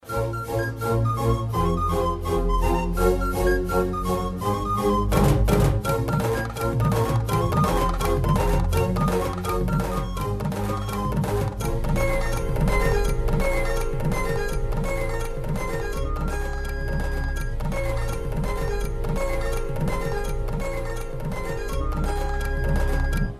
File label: Love BGM